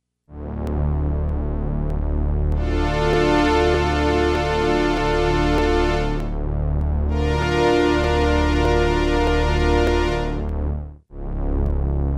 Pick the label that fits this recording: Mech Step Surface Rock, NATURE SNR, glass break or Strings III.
Strings III